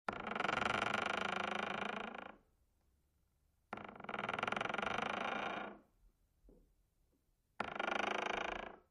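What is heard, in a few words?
Door Open Close Close Up With Squeak, hinges; Door Oc, Cu W Squeak.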